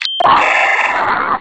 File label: zombine pain; scmod